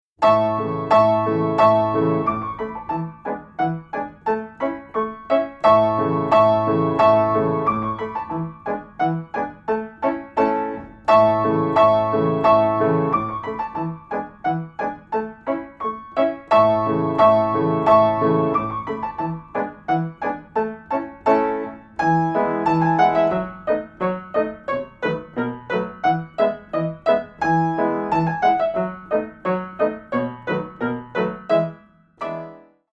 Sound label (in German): Polka